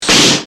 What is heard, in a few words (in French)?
Ballon explose